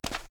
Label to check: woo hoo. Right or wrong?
Wrong